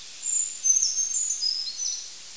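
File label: Brown Creeper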